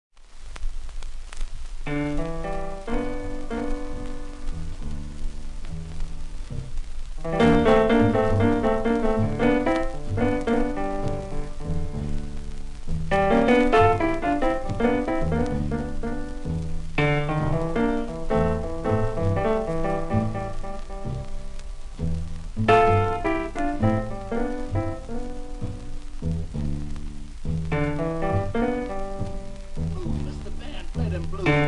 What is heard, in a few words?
Blues